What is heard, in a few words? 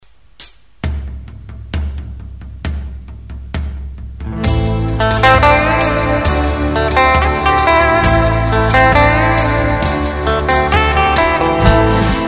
Gold Grade Bridge, guitar pickups